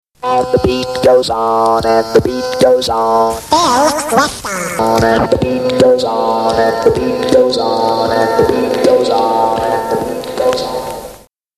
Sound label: Jingles